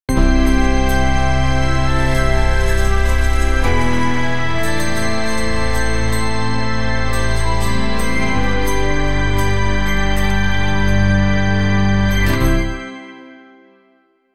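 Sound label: victory music, slot machine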